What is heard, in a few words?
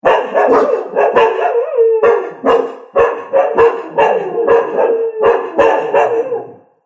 Pack Of Dogs; Dog Sound Effects; animal sound effects